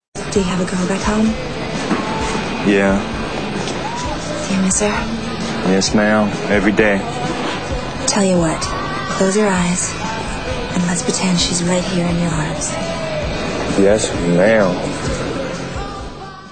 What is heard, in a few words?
barb dance